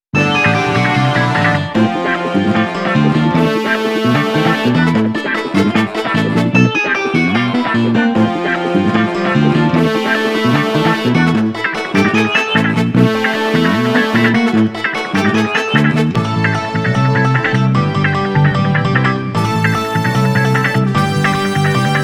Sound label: cheerful entertainment no drum organ; by burak sound bank; cheerful vol 15